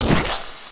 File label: splat